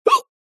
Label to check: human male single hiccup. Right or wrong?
Right